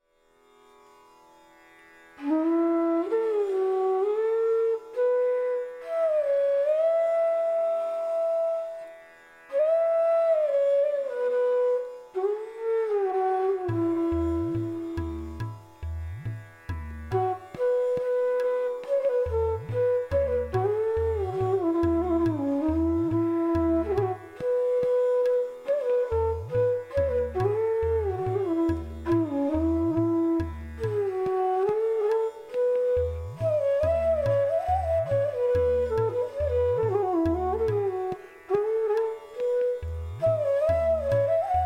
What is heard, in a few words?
Raga Durga Bansuri; Bansuri Student Zone